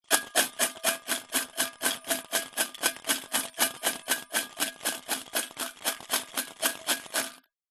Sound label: foley money collection tin coins inside shake; zapsplat